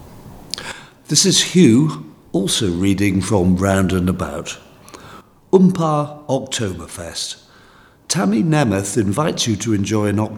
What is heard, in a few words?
Oompah; Octoberfest